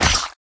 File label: Enemy Boss Attack Babies Explosion; Smilinguido